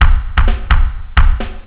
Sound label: Drums, Cisco Unified IP Phone 7945G